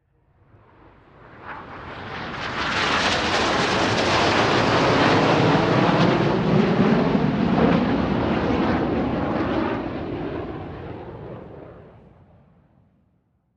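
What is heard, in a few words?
Plane overhead Jet Formation; hawk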